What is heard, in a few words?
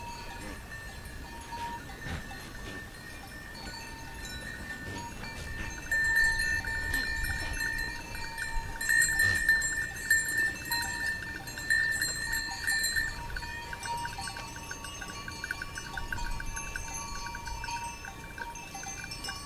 Goats in the dust